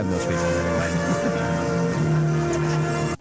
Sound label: stop talking music